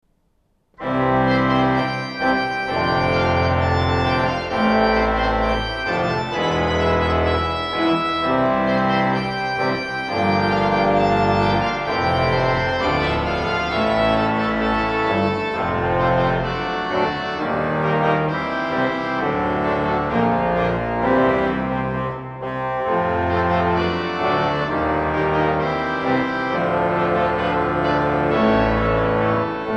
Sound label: Flourish; Noel Rawthorne